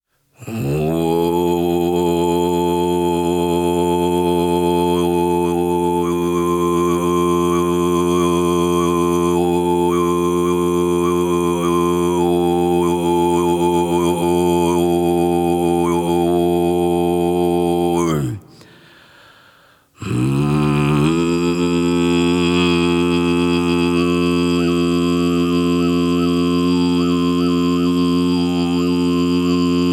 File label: Mongolian Throat Singing